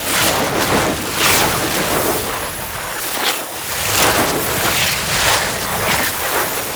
Grounded Staff Loop; Vazarin; Focus; Ultimate Abilities

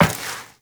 Step Metal Perforated, Footsteps, Walk; Warframe; Tenno

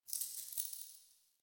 Idle Head Shake; Ambience; Warframe; Entrati; Tagfer

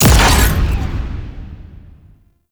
Immunode Explosion; Gameplay; Warframe; Veilbreaker; Devourer Kahl

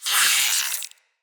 root spider vocal pain; Animals; Spiders; Vocals

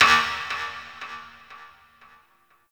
Ship Energy Knock; Warframe; Corpus Ship Remaster

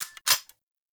Double Barrel Shotgun Mech; Warframe; Weapons; Tenno

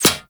Arrow Impact Metal; Warframe; Weapons; Bullet Impacts

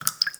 Water Drips; Ambience; Props; Warframe; The New War; Zariman

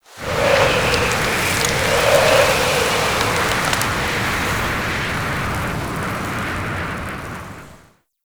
Settlement Wind Debris One Off; Warframe; Grineer Settlement

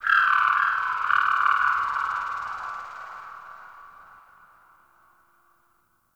Sentient Texture Medium, Ambience; Sentient Lair

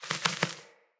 Sparrow Three Wing Flaps; Animals

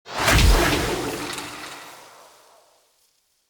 Decoy Activate; Fey; Saboteur

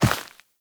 Walk Dirt, Footsteps; Operator

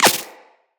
Laser Impact Flesh; Weapons; Bullet Impacts

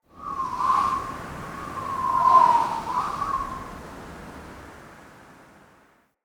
Cave Ocean Wind Gust

